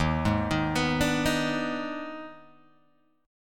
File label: D sharp Major 7th Suspended 4th Sharp 5th, Guitar, Open G; 1,1,1,4,3,1; chordshape